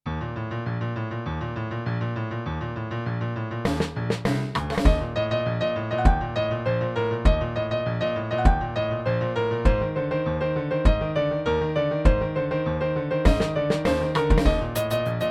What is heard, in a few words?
Retro train